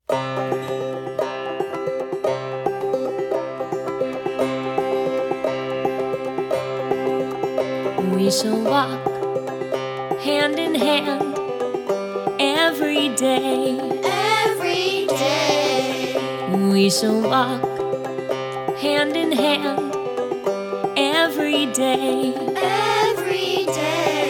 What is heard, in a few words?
folk song; We Shall Walk; Debemos Caminar